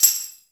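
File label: drums, Pure Electro; USB Soundscan vol.03; AKAI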